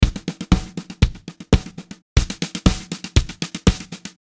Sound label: Snare